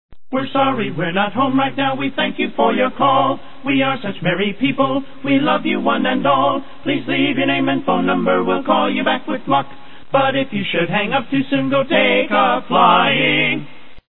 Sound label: Singing Answer Machine